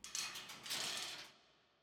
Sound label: ambience room torture cage